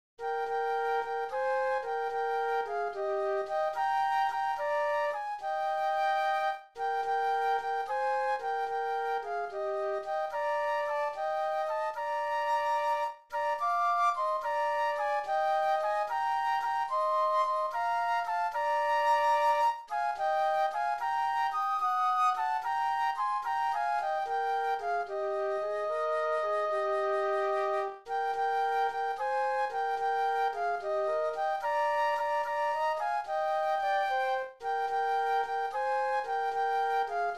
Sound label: Flute Duet